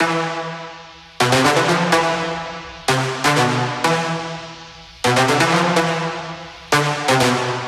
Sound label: Brass Loop - 125BPM - Amin; 99Sounds x Monosounds; Instrument Loops